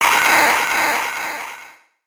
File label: thorn death